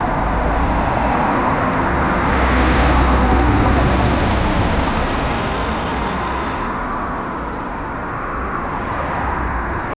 psychedelic city traffic

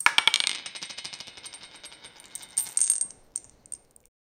realistic sound of wooden, dice

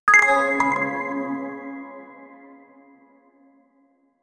mysterious object sound

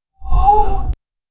a gentle airy whoosh; voiceover

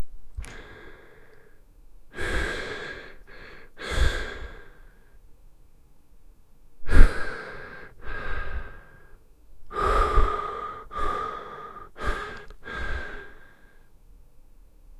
slowly exhale through nos, breath

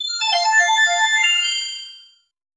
rpg game healing skill

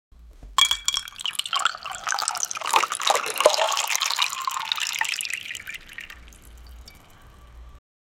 cups sounds, mug

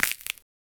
resource completely harve, harvesting

